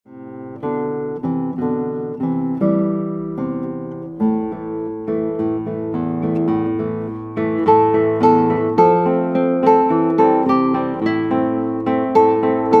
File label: Guitar